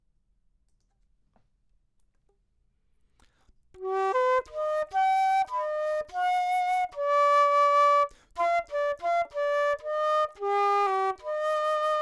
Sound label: flute; low signal on mic